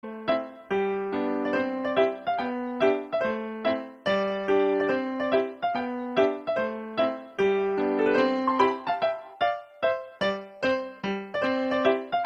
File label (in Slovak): veselá